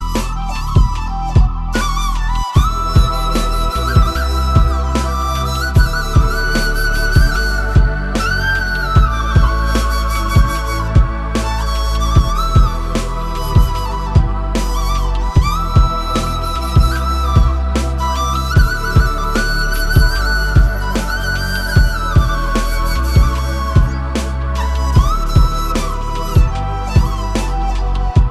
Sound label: Flute Instrumental Ringtone; Dhurandhar 2